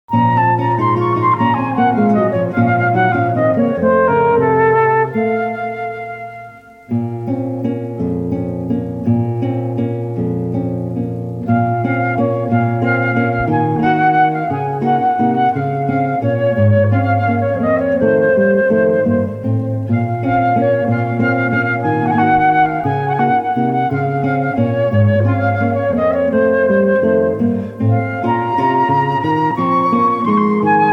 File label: La Llorana Yucatan Folk Dance